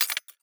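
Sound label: item lockpick up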